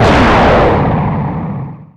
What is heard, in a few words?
ROCKET DOG